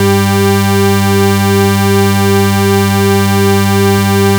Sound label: MOOG BS4 G2; MINIMOOG; Greytsounds VOL-1